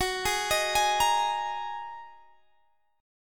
Bb 7th Suspended 4th Sharp 5th Gb

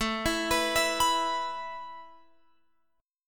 A Suspended 2nd, down, Guitar, Open F; Asus2; arpeggiated

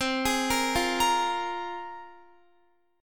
C 7th Suspended 4th Sharp 5th, Guitar

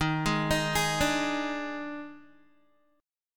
Eb Major 7th Suspended 4th Eb, Guitar; EbM7sus4; arpeggiated

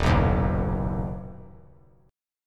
D sharp Minor Sharp 5th D sharp down; strummed; D#m#5; JGuitar

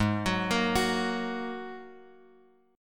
G sharp 7th Suspended 2nd G sharp; G#7sus2 Chord {4 X 4 3 X 2} arpeggiated

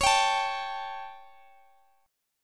A 7th Db

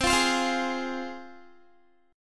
C Suspended 4th Sharp 5th, C, down, Guitar

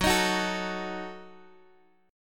F sharp Minor 6th F sharp Guitar Open D

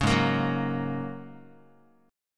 G sharp 9th Suspended 4th, Guitar; strummed; chord

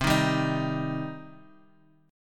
C Minor, Guitar, Half Step Down

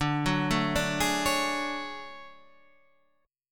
D Major Sharp 11th D Guitar Standard, chord; arpeggiated; JGuitar

